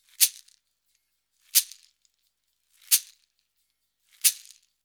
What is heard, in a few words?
089 FREES, Modern Percussion Loops